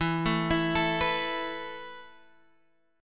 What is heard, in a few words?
E Suspended 4th E down Guitar Drop D